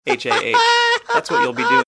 laughing listener voicemail jingle

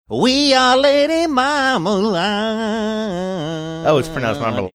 singing we are

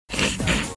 snickering

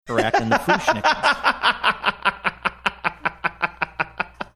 having good laugh

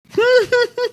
cute laugh

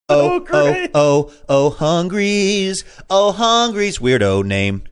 singing oh oh oh oh

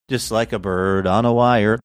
singing just like

